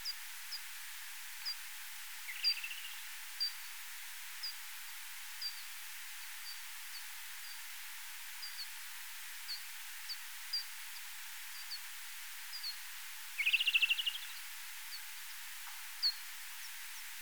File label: Pipistrellus abramus